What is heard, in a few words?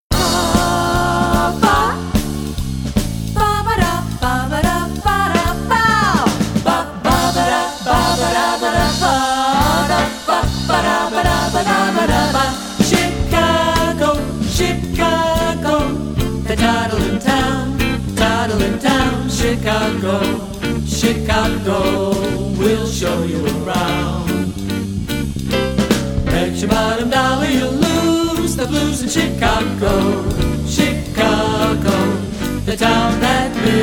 Chicago, Swing; Jazz Spectrum Vocal Quartet